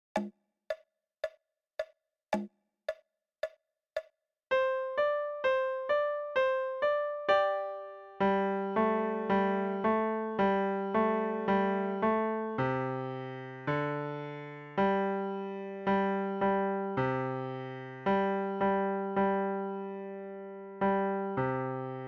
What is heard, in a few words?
Little Swallow left